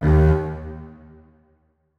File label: notes, keyboard; Hideout Furniture